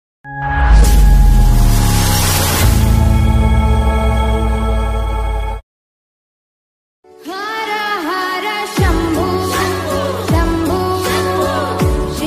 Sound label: shiv reverb ringtone bhakti ringtone